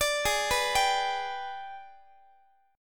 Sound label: G sharp Minor Major 7th Flat 5th G sharp, Guitar; G#mM7b5 arpeggiated; x,x,17,18,15,18